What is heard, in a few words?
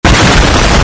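short explosion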